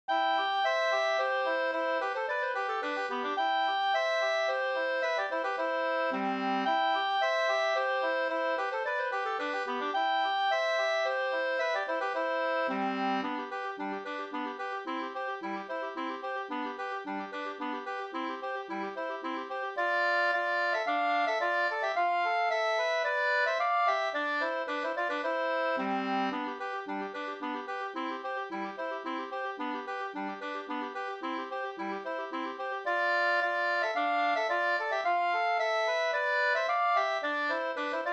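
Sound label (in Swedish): slangpolska, two-part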